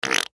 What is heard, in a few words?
Human Mouth Fart; Mouth Fart Wet Short Male Ten Yrs Boy; Blastwave